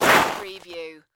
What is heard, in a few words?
Baseball Slide Base; sound effects bible